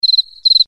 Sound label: CRICKET TONE